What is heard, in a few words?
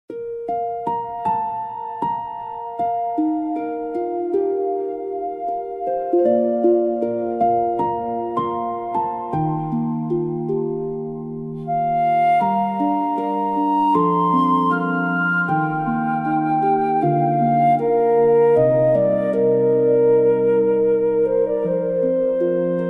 fantasy music nature forest; ghibli style; healing; nostalgia